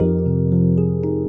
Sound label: epiano riff